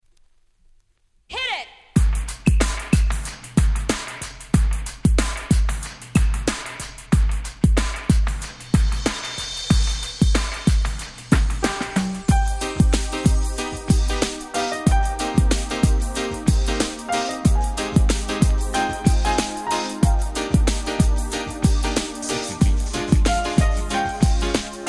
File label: piano